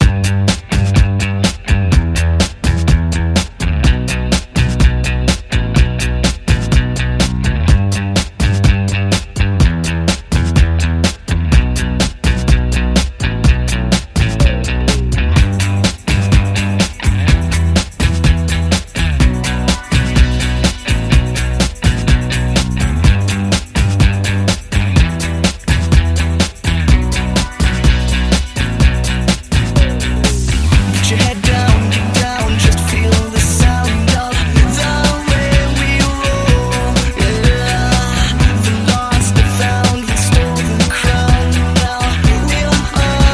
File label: indie electro pop